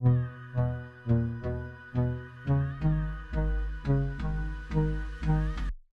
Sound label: chords pluck